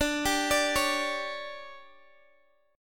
D Major 7th Suspended 4th, Guitar, DADGAD

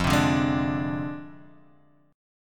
D-Minor 7th Flat 5th, F

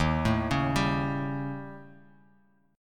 D sharp 7th Flat 5th, Guitar, Open F; arpeggiated; D#7b5; JGuitar

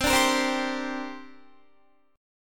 C 7th Suspended 2nd, Guitar; C7sus2 strummed

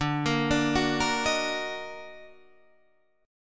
Eb Minor Major 7th D, chord

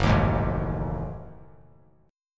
Gb Minor 6th Add 9th Gb down; strummed; JGuitar

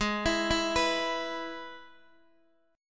Ab Minor Sharp 5th, Guitar, DADGAD; down

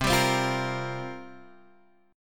C 5th 11th C; C511 Chord {X 3 5 5 6 6} strummed

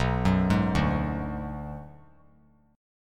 B Minor 6th B; Bm6 arpeggiated; JGuitar

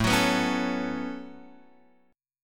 A 6th Add 9th Guitar Open F, chord; A6add9; strummed

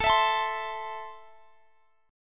A Minor, Guitar, Standard; x,x,19,21,22,20; Am strummed; capo=3

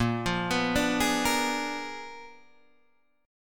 A sharp 7th Flat 9th, Guitar Open D chord; down

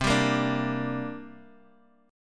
D 7th Suspended 4th Sharp 5th C

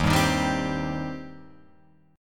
D sharp 6th, D sharp, down, Guitar, Open F; strummed; JGuitar

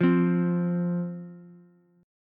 F 5th F Ukulele; strummed; chord; x,x,1,3; JGuitar

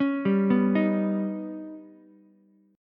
D sharp Augmented 7th D sharp, Ukulele; arpeggiated; JGuitar

